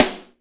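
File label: snare drum, acoustic set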